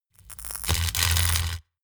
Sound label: spark; comedy cartoon; elektronisch